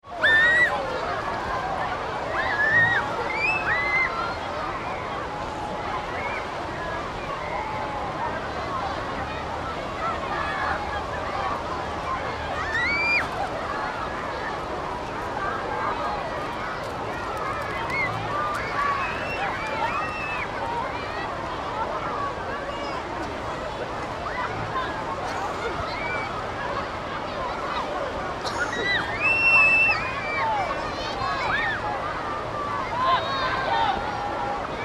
Seaside Crowd Holiday Activity, Busy crowded beach atmosp; Busy crowded beach atmosphere; Holidays; Holiday Sound Effects